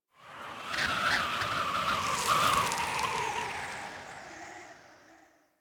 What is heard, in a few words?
Wind Gust Large; Ambience; One Offs; Warframe; Eidolon Plains